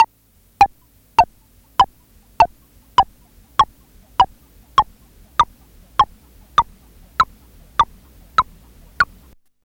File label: Insectus, Hard & Loud Techno; USB Soundscan vol.01; AKAI